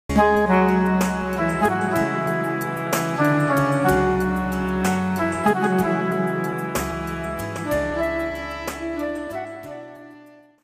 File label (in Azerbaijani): skripka; zeng ucun super musiqi; zeng ucun ses